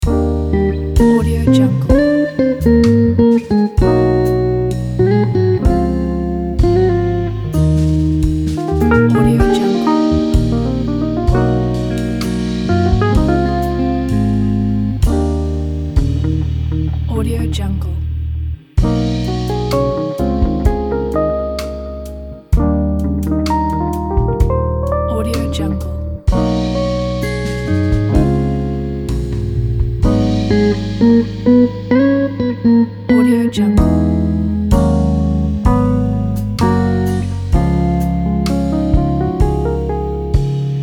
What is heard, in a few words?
pop jazz ballad loop